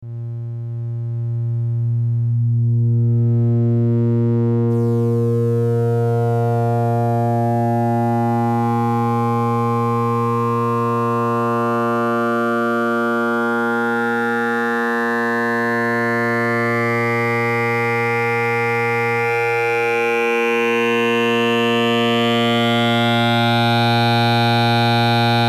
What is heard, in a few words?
wavefolder; Modular